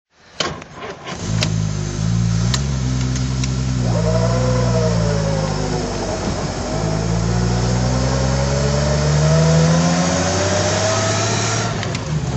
talbot lago t23 cabrio drive

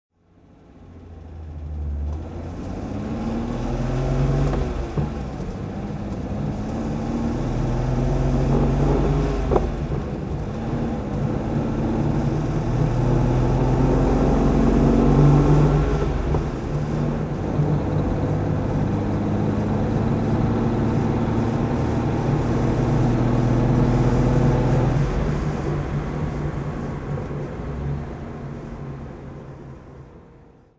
lancia flaminia c driving; Lancia Flaminia Pininfarina Coupe, 1962; Engine sound driving; V3D/ ClassicarImages; Marc Vorgers

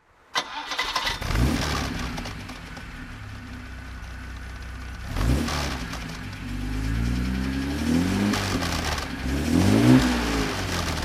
lancia flaminia c throttle; Lancia Flaminia Pininfarina Coupe, 1962; Engine sound; Engine sound driving; V3D/ ClassicarImages

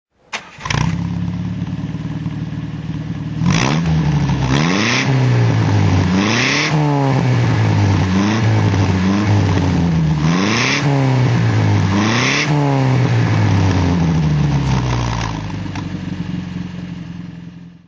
facel III throttle

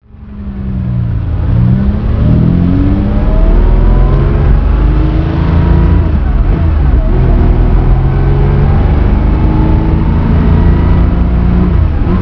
250 GTE drive, classic car

